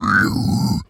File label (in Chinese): 猪灵蛮兵愤怒声